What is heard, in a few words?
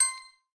counter; spinsane